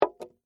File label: Whiskey Glass Down; Foley; Drink Mixing